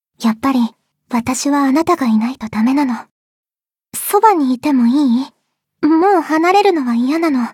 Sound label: applause